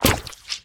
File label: Queen Ranged Attack; We Are the Dwarves